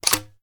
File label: reload fast shell in, sh; gmod; wfoly plr sh charlie725